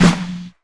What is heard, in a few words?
dark sounding steel snare drum f key